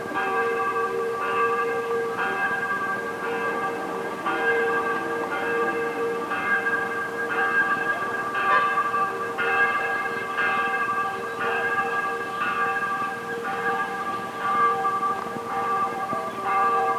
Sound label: bells